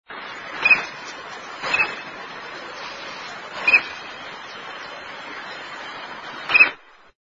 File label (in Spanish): voz barcino